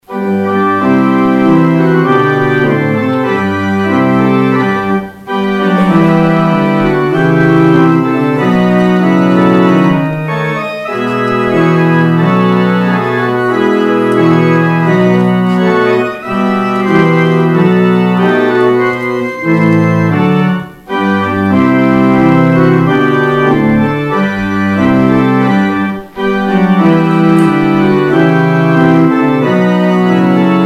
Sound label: organ outro; Organ exit music; St. Mungo's